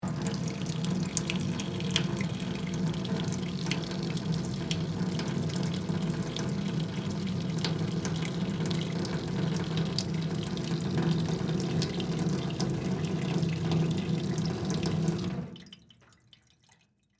Utility Sink Run, Industry, Utility, Sink, On, Run, Off, Faucet, Metal, Basin, Slow, Long; Bathroom; BlastWave